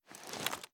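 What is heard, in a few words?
submachine gun reload arm down; gmod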